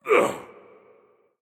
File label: hitreact human male small